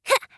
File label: ouch girl; spiritheroes